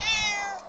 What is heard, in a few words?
meow; space kitty